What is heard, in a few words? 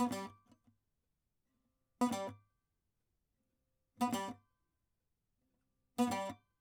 saz